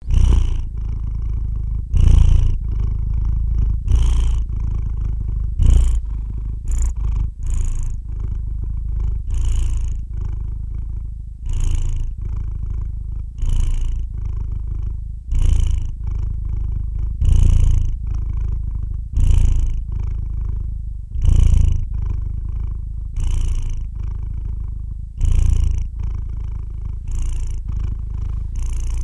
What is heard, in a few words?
quiet purring of domestic kitty; murchaniya koshek